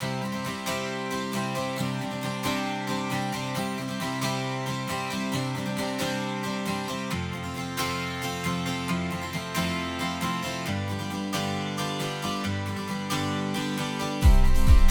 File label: music